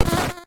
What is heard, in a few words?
Open Chest; Cathedral